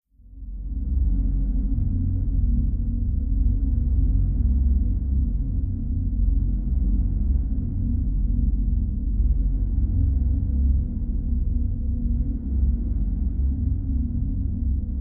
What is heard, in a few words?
Breathing Drone; drones & tones